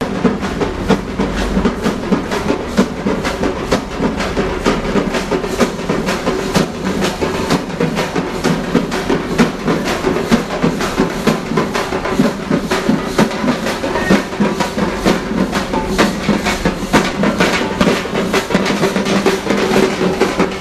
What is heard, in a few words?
drummers